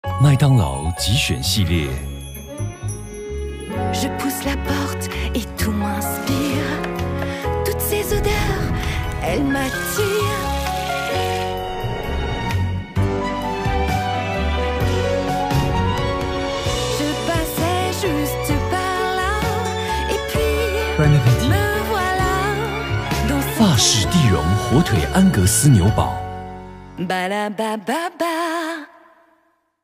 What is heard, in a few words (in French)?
Pub TV Chantee, voix feminine; MacDO